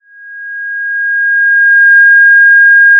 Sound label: Sound of a police car siren